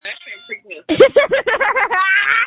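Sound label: screams that feature laughter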